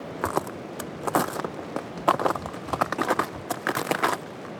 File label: Ice Steps, On The Glacier; outdoor